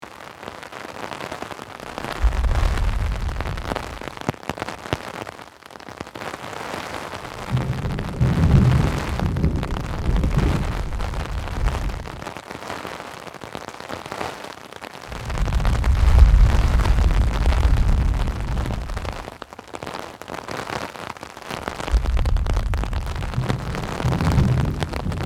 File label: Rain on umbrella with thunder loopable